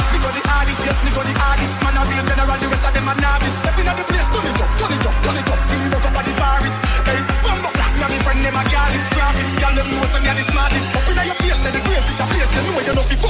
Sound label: emotional